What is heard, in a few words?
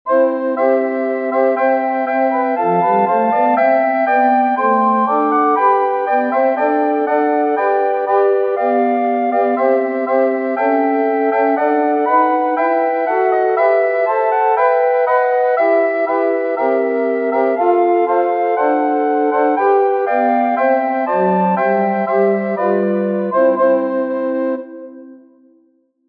Recorder Quartet; Navy Hymn; Bill Gassman